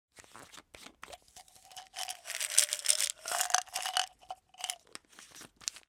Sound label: BOTTLE PILL